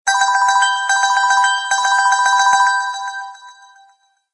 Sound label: Clear Ringer, Ring Tones; Pantech P2030-Breeze-3